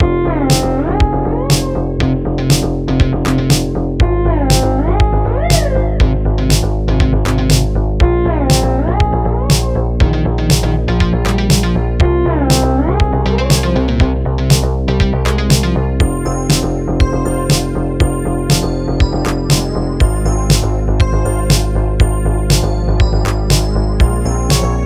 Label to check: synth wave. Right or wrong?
Right